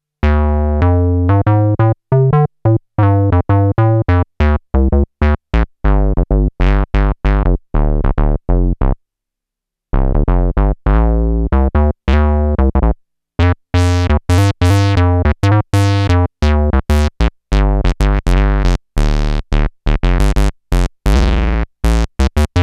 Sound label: Clean Square 1osc Funk Bass